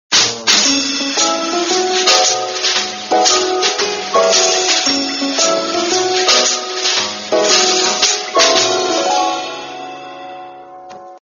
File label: Jazz